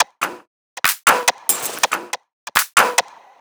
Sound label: Bpm141 Top Loop, Dubstep; Melange